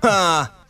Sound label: huh male, Voice